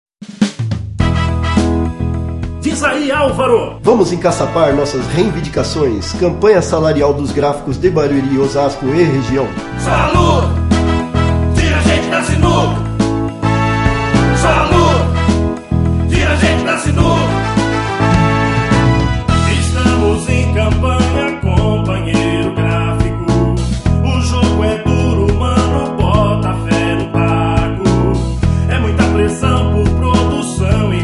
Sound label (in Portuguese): Jingle campanha salarial graficos